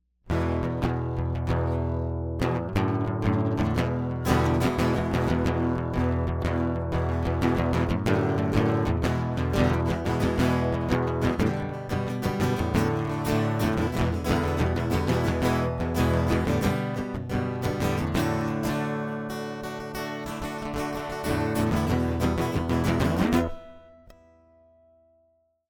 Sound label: acoustic guitar; MegaEpix Enormous